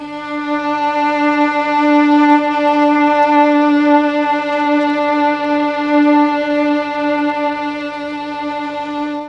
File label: D4 300 VIOLA, mellotron